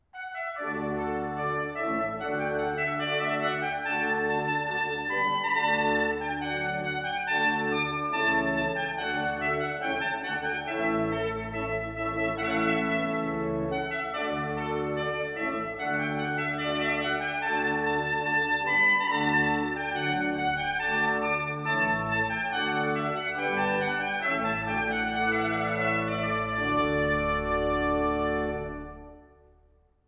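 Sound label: rondeau trumpet and organ; weddings; mouret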